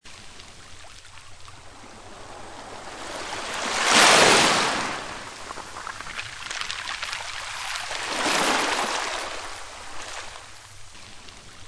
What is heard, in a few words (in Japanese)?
小石の海岸線